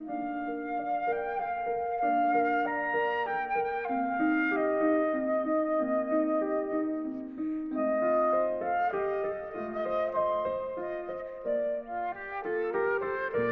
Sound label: Bach Sicilano; Samsung